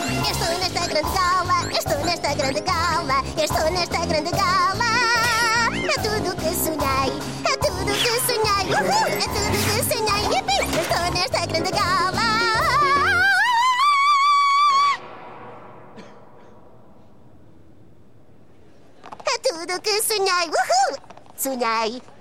(European Portuguese); Season 1

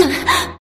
Grunt; Lewd SFX

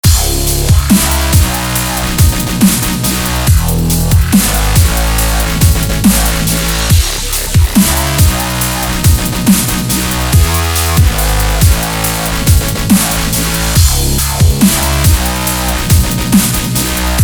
dubstep

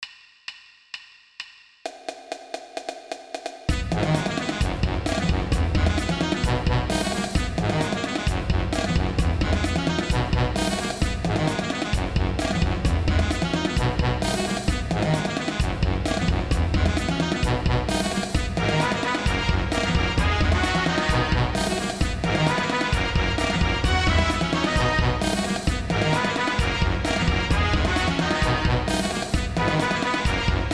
Marching Band Cover

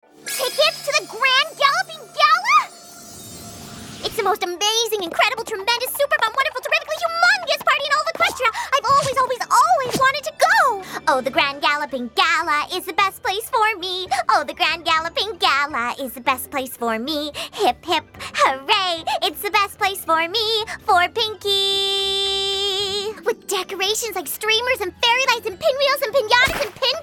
Vocals; My Little Pony OST Repository; Season 1; Bonus Music

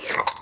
Suck

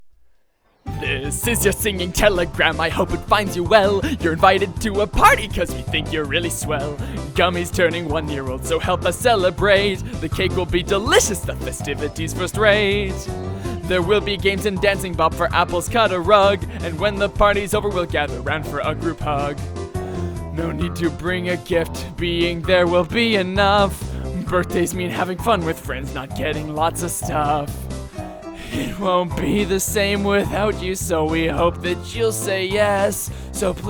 Singing Telegram

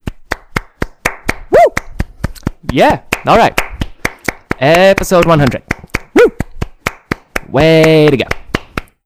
Ponies Cheering

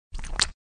Suck; Lewd SFX

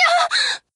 Moan; Lewd SFX

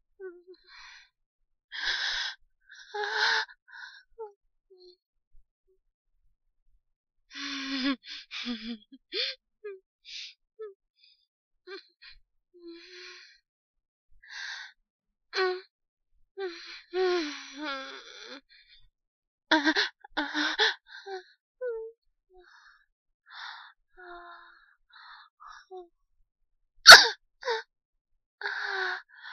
whimpers; whimpers for TUMS

